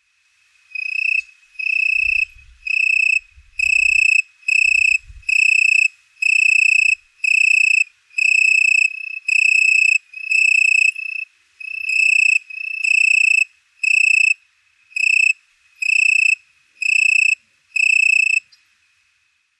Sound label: tree cricket